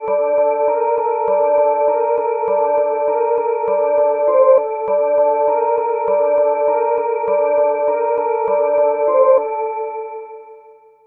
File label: Melody, Akai Pads; Mule